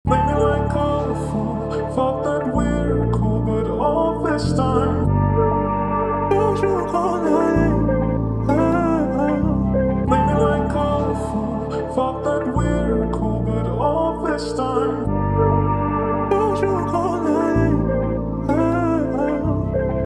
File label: 96 BPM D Min; Cymatics; Crunch Time; Havoc Collection